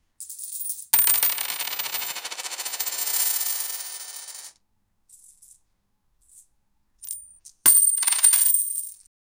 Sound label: money sounds of coins; finance